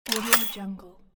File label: cash register